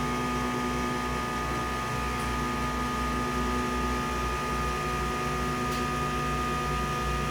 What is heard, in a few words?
elevator